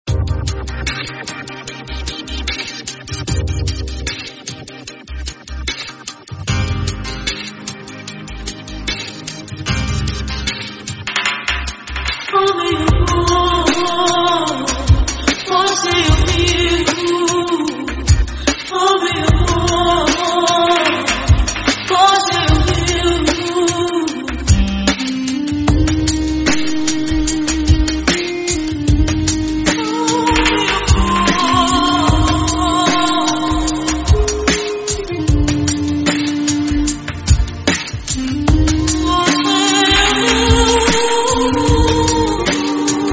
Theme Female; ye stupid pyar 2011

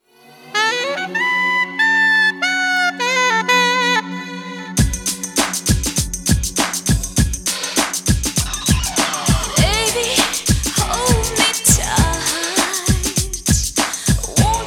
Car Wash Music; koi mere dil mein hai 2005

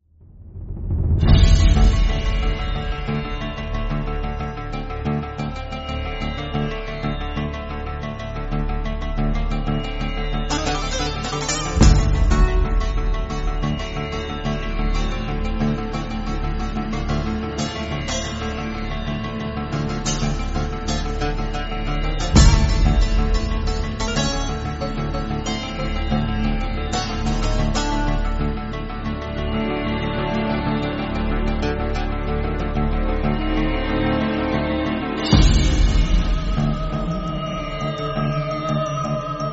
Instrumental

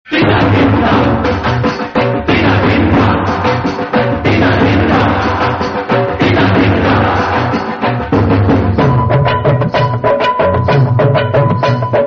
Theme Instrumental; ram lakhan 1989

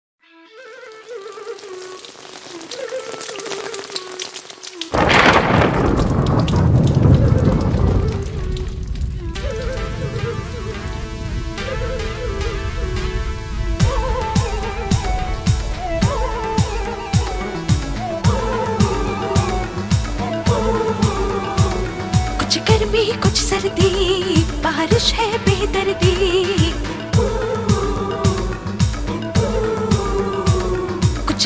Female Version; insan 2005

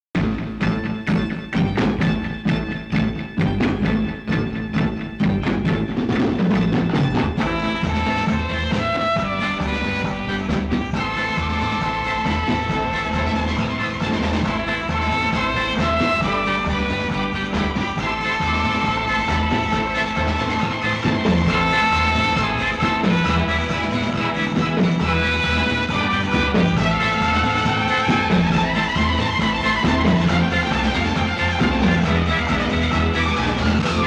Climax Music; aapas ki baat 1981

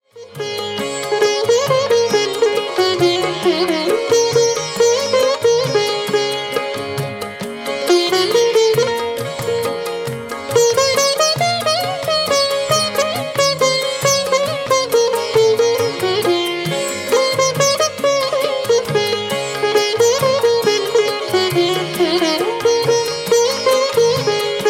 Welcome Home Instrumental

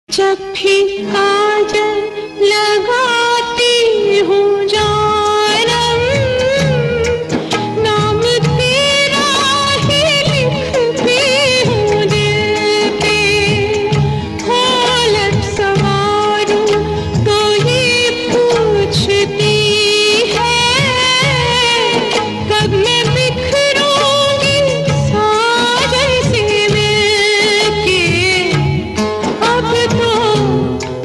Sad Solo; kanoon ki zanjeer 1990

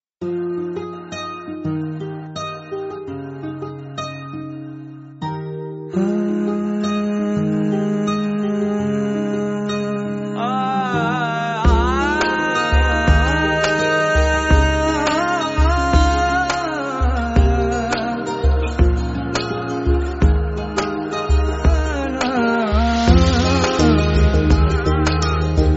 Female Version; once upon a time in mumbaai 2010

